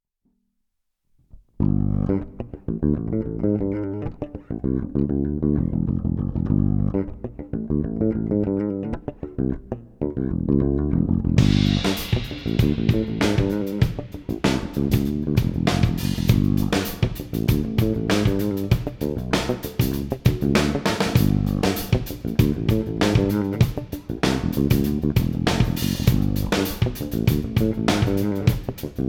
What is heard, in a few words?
Modal Train Jam Bass & Drums no Piano